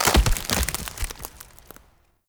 Tree Dead Small Destroy; Objects; Destructible Trees

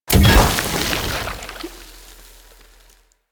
Harvester Item Placed; Ambience; Warframe; Venus Open World

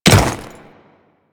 Ice Step, Footsteps; Royal Guard

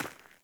Sandals Run Rock, Footsteps; Characters; Player

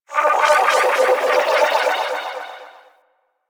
Ship Data Transfer Pulse; Ambience; Warframe; Corpus Ship Remaster

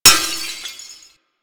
Glass Impact; Warframe

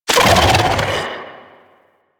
Sandstorm Cast; Warframe; Mummy

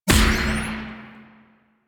Pistol Active Reload Success; Weapons; Warframe; Drifter; Operators

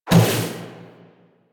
Guardian Shell Impact; Warframe; Focus; Vazarin

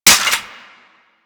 Sicarus Mech 2D, weapons; DE; Warframe

